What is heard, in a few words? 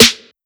Snare Grow